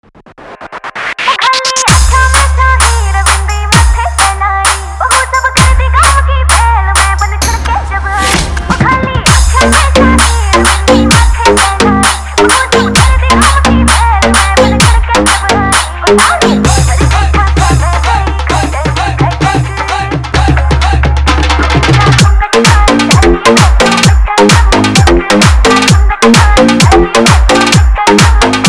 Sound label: Haryanvi Deshi Dance Remix